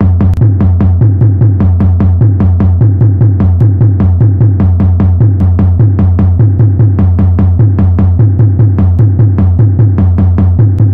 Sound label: waltz